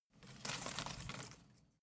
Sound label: home plant leaves rustle soft